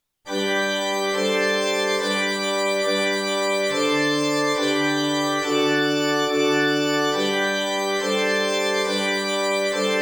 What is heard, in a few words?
Choral Unusual harmony, Organ; BWV147-6の元Choralによる(コラールらしからぬ)月並みなハーモニー 主はわれにいます